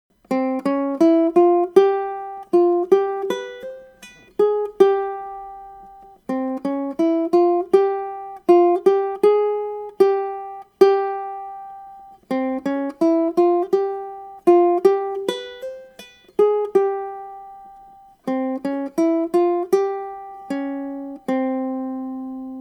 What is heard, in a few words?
Arabic Example, ukulele